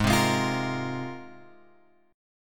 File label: G sharp 6th Add 9th G sharp; G#6add9 Chord {4 3 6 X 6 6} strummed